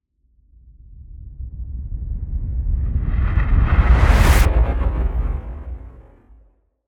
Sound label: Whoosh